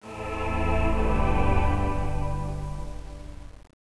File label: pad; Angelic Layer